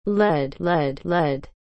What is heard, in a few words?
lead